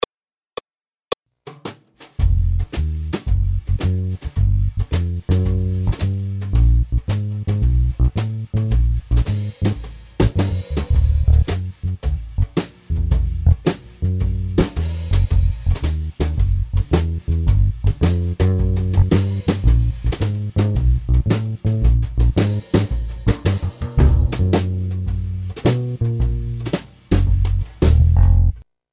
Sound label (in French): Chromatic blues Accompagnement seul; Jazz